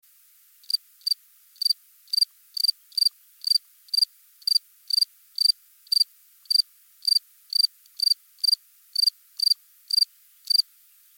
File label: Gryllus campestris lab recording 21°C